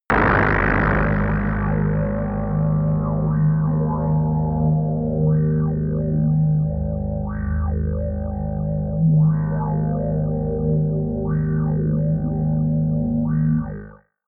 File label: lightning wobble